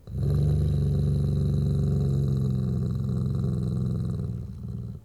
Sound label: growl; casualtiesunknown